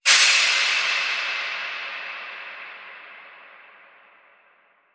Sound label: Stale Air Airy Hit